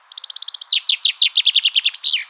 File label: bird, ambient animal; Counter Strike Model Pack; CS:S